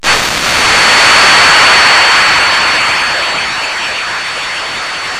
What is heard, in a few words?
Static Burst